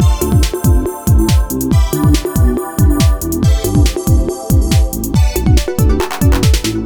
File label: loop bgm